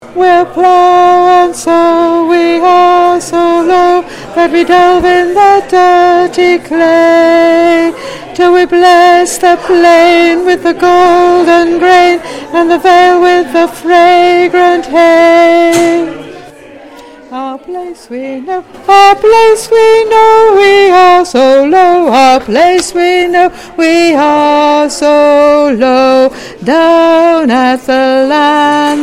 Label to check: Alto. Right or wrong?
Right